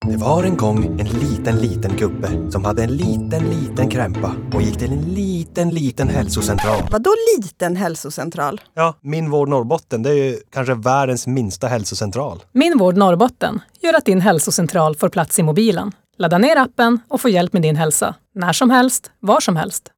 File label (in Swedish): musik